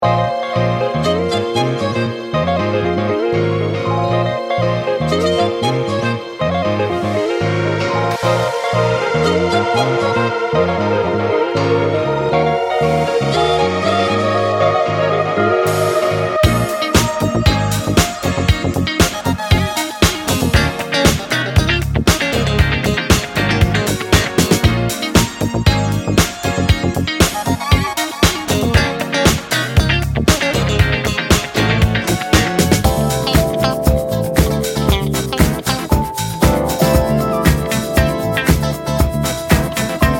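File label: revival funk disco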